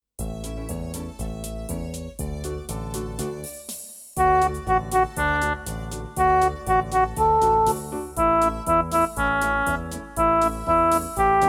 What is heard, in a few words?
Melody